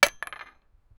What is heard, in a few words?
stone pickaxe striking; mining